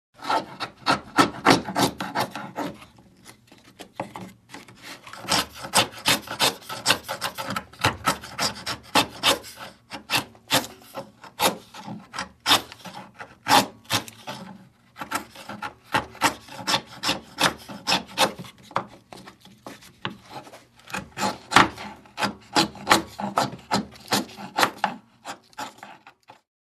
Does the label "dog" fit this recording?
No